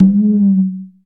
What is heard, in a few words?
percussion congas